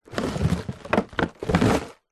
food candy shop boiled sweets wrapped in plastic tub tip movement